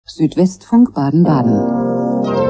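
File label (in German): sonntags hörspiel, Radiojingles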